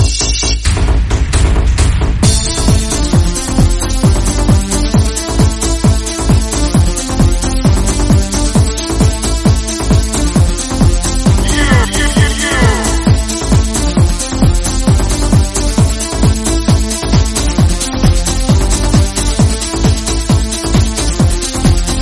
90s dance mix

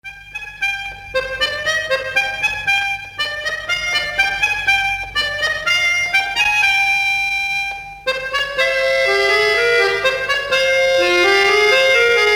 instrumental

